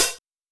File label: Linn, LinnLinn Drums